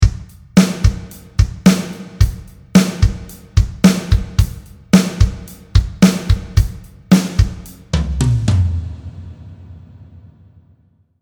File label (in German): Drms Modern angepasst